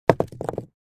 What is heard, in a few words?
Small rock hit hard ground